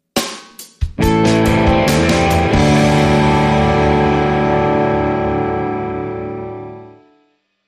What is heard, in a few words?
Rock Broken Chords